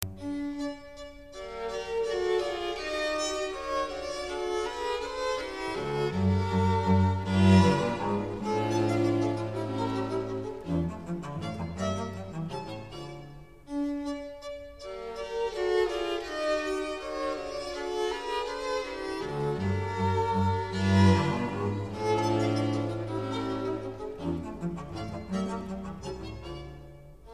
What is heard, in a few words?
Haydn String Quartet